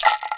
RATTLE, INDIAN PERC

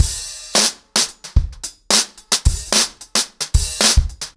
110BPM, ACOUSTIC DRUMS; The Roots Drumloops

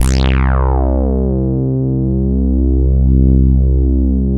OBERSYN C3

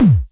KLONE, KICK, Klone Dual-Percussion-Synthesiser; KLONE KICK NW8; 300 Drum Machines

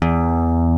LES PAUL

